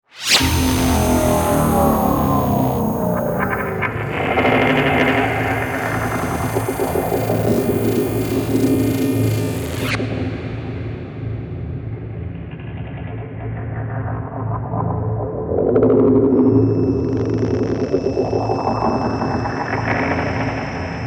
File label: Ambience